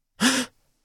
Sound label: Human, Adult, Male, Breath, Surprise, Gasp; UberDuo; Character Playset